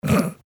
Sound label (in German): lachen weiblich